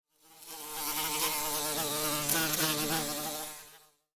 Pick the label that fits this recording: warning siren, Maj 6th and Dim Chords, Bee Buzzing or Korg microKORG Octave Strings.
Bee Buzzing